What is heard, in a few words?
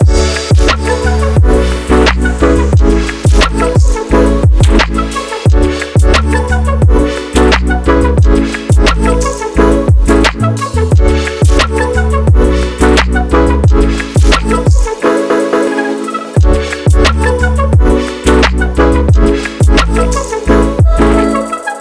vlog hip hop loop